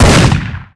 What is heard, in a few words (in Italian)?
sparo, armi